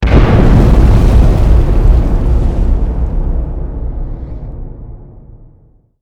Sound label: vortex ring cannon; Weapons; EK modern; Barotrauma